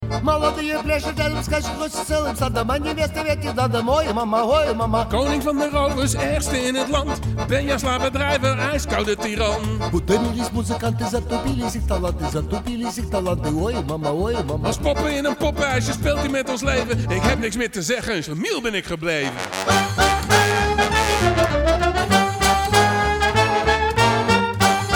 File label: klezmer